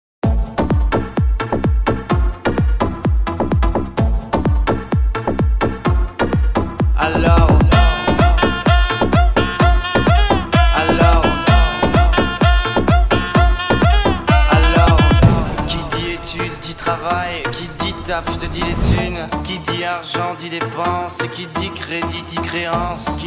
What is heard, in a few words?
Only Beat Mix